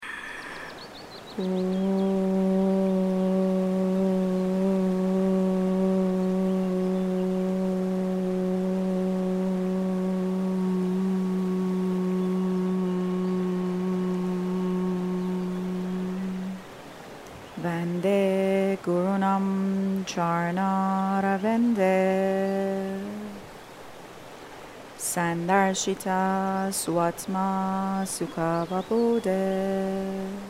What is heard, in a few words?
opening chanting, yoga; what is chanting